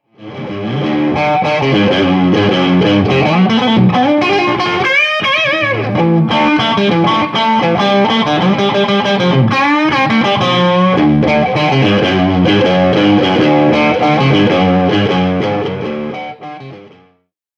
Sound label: stratocaster clean moderate gain